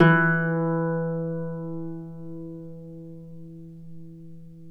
Celtic Harp, Plucked Strings

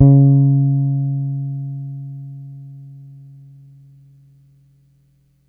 Ds C#4 hard, Bass, Pedulla Thunderbass; Giga Samples Collection

